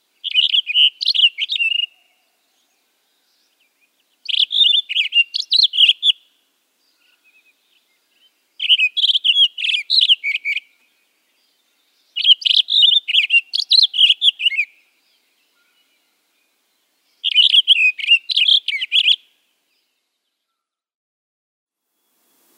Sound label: Townsend's Solitaire